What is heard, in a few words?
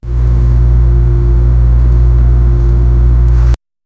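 doctor office ambience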